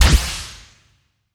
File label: plasma weap test